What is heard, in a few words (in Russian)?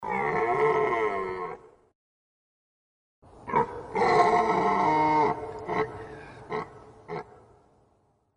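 Изюбрь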